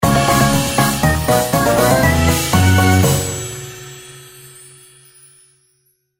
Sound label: epic Win; Fluffy Favourites Megaways; win levels